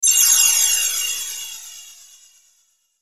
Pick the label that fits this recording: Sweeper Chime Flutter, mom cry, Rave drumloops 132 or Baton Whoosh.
Sweeper Chime Flutter